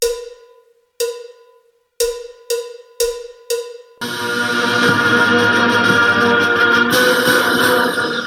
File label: Gb Key